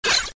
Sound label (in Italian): suoneria botton; beep